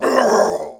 death, male; bootsector male; midnight guns; bootsector male 00

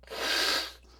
idle, snake; midnight guns

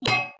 armor tink; impact; midnight guns

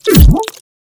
stimshot; midnight guns

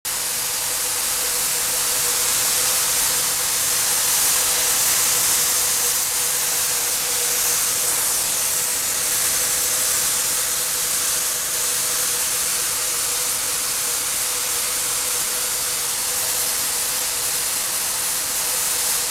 steamhiss; midnight guns

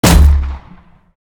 fire, pistol; midnight guns; gpistol

